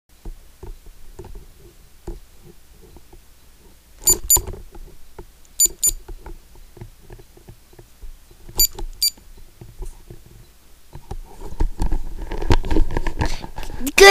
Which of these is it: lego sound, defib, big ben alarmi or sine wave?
lego sound